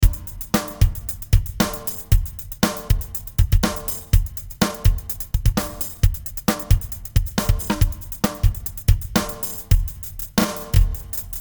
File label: 80s Pop Beat VariSpeed